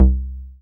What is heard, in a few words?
303, TB-303 BASS